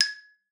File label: G#5, XYLOPHONE; Xsample VOL-4